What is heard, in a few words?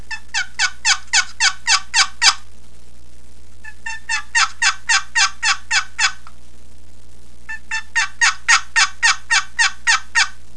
camo walnut yelps, turkey; dixie